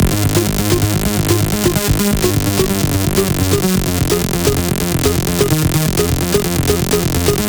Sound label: 128BPM Melody; Vengeance Samples; VFH1 128BPM Daylight Kit